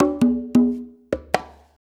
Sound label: Blues Perc Loop; Drum Tools